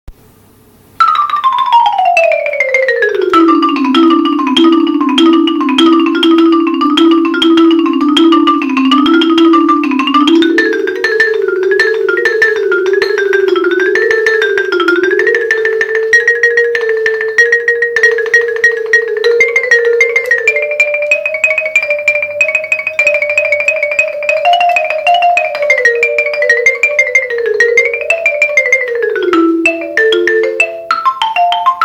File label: flight of the bumblebee marimba